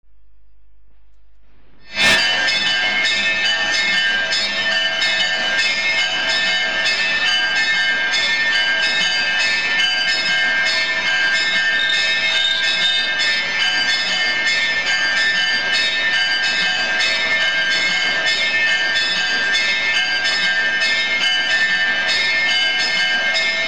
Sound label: Bells In A Temple, Field Recordings From India Nepal; Jani Hirvonen